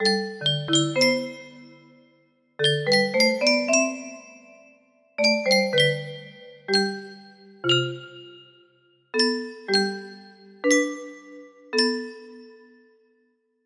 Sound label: music box melody; fazbears hills demo